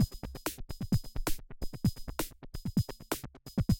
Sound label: loop